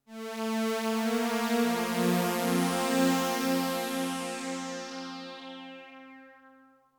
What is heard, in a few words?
Bright Strings; opxpro2; Riddle